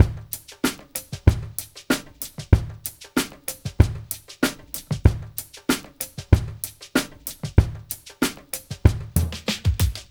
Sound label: ABO MIX, Liquid Grooves; Spectrasonics